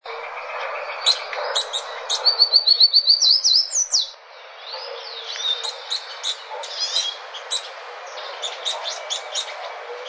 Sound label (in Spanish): voz arañero coronado chico